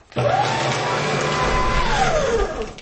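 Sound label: sound of device that destroys documents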